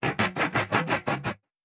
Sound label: Saw Wood; Fantasy Sounds Bundle; Craft